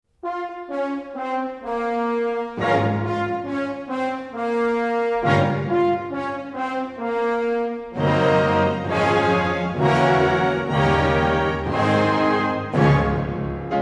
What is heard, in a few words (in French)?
concerto piano; tchaikovski; postromantisme